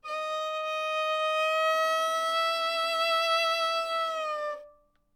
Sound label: Gliss up Down